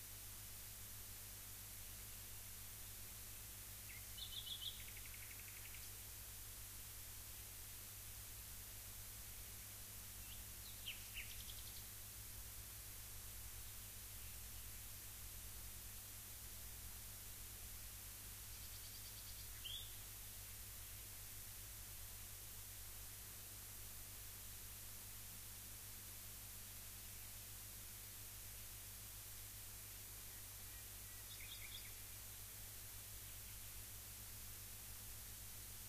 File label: rayol farm; SEAMED